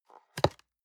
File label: box small open medium